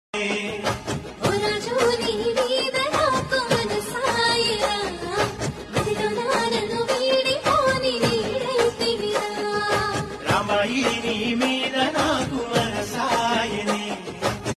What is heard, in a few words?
female Ringtone